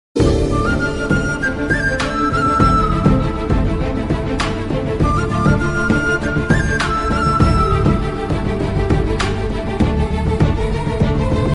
bgm ringtone south love bgm ringtone telugu love tone; manam movie